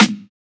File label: Sn, Snares